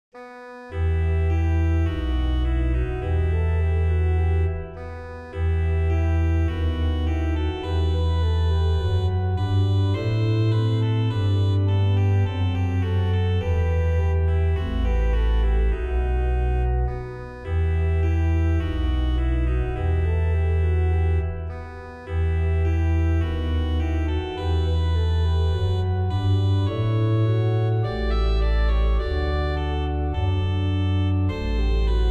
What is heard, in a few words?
trumpet tune in E minor, organ; Grafhorst 028